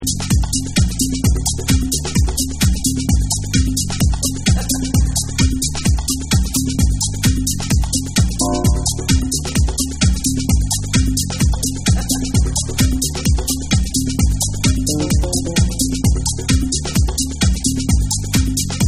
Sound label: A GREAT LOVE A Deep Tribal Vocal